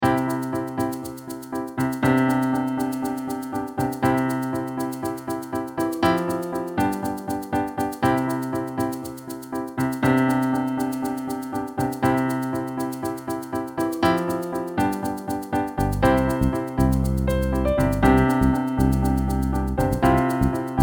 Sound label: beginner backing track